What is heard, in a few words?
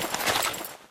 draw pda engineer; tf